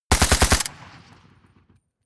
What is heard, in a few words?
rifle ak-47 exterior fire burst close; Firearms; schusswaffen